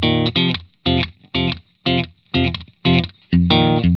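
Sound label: 120 F, Vintage Blues Guitar rhythm; USB Soundscan vol.22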